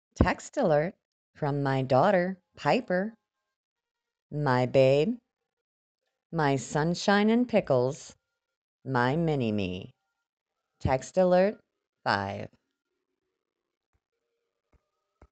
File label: text message; Piper